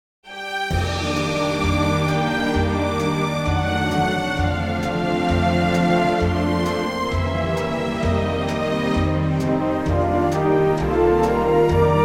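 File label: orch Bb, Backing Tracks for Male Vocals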